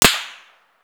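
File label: supersonic snap, bullet snap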